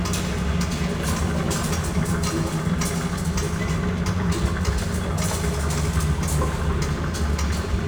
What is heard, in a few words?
Fan Large Loop; Ambience; Props; Warframe; Nokko Colony